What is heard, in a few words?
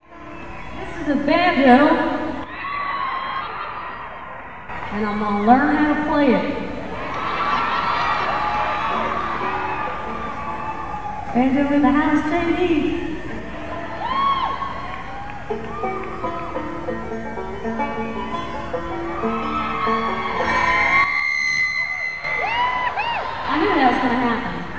crowd talk this is a banjo; new orleans 1996